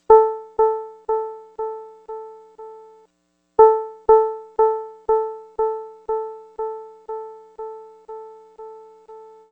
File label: Decibel Ramp